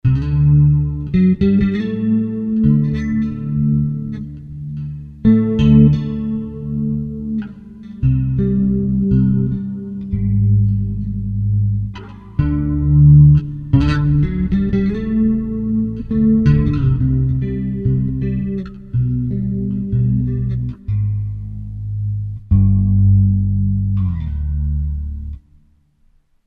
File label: SOLOIST, Zoom 506 II